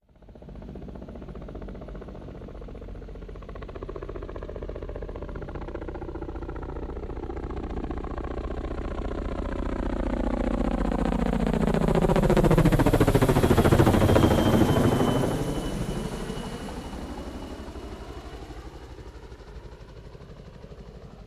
CH-53 Super Stallion helicopter hovers overhead. Choppers, Aircraft Engine, Helicopter